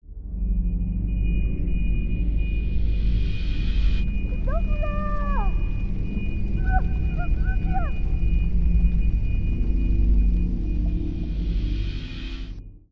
一楼楼道记忆闪回; 一楼楼道闪回bgm

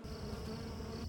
楼道场景

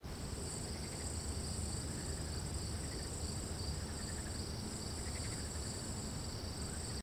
室外院子

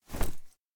关闭页面声

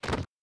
书店内 书本掉落

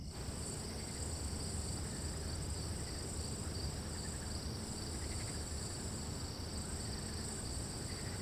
室外院子

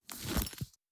笔记菜单

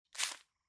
纸条

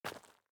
室外, 脚步

